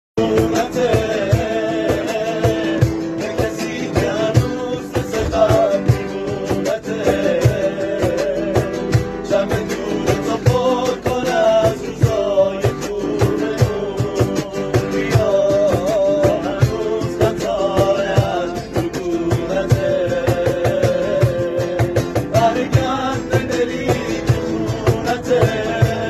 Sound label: Guitari